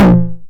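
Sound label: 909 high tom tape saturation; Toms; Goldbaby Tape808 & Tape909; The Tape 909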